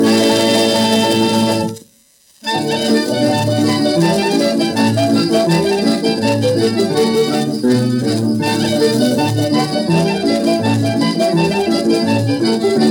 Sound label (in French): Fado - Portugal